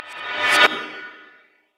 respawn; LoL

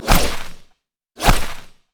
beam out; khazix

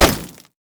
axe throw grab; olaf

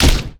gangplank pistol; LoL; special forces gangplank

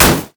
basic attacks; Ziggs; poolparty

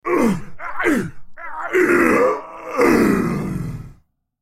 dying; TryndamereDemonsword; en US

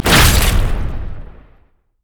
savagery five stack; rengar

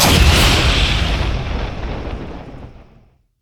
spectral; LoL; yorick

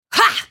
ultimate effort; Diana

